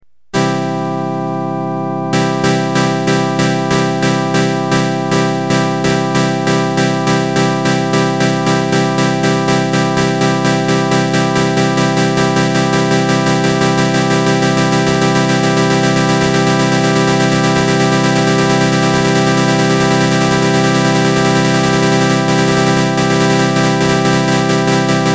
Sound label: Repeat, Gibson